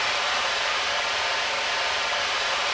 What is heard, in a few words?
ambience pipe